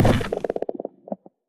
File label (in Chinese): 嘎枝之心落地声